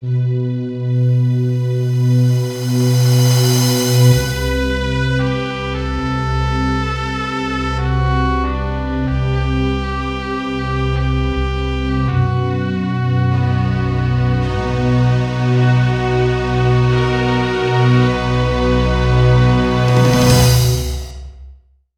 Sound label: Theme OPENING; The Adventure of Little Ralph; Holy Sword's Theme